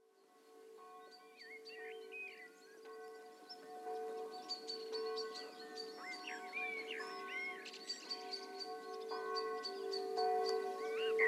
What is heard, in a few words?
BLACKBIRDS BELLS